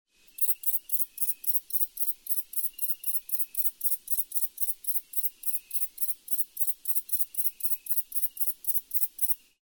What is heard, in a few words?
katydid